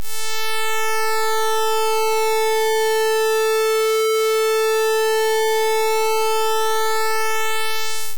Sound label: pulse width